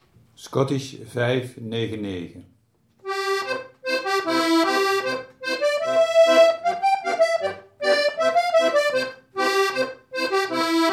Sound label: schottis